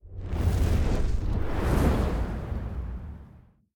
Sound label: transition map hotspot